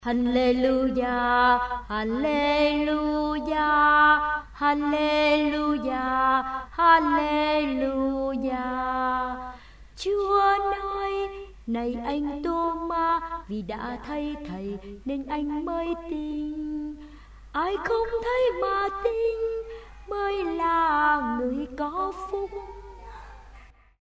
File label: alto basso